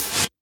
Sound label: Minimal Perc; Minimal Percussion